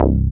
BAS, Eurotech; Sounds Good

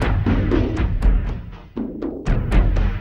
Elektro Crash, Drums; Sonic Foundry (Sony Creative Software); Friendly Fire

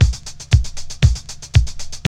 house loop

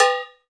COWB, Cow Bells, Roland; Club-50 - Foundations Roland; CowBel+Metl

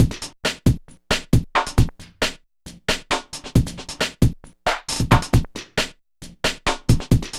619 GRVD, Boom da Drumz, Grooved; E-MU Producer Series Vol. 6 – Analogia Project

